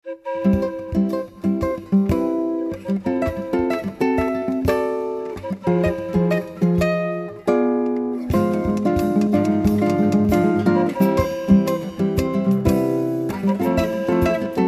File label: Brazilian Cafe